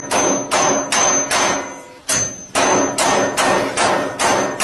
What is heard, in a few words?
clank clank clank